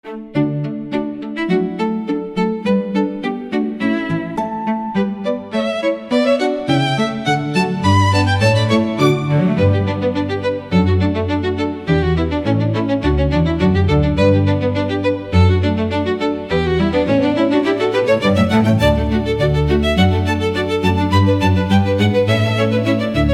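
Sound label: Souvenirs chamber orchestra, Romantic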